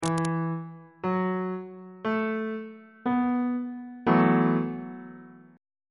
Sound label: c flat dominant 7th suspended 4th 1st inversion